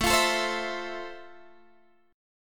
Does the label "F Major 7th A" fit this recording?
Yes